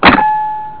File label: shoot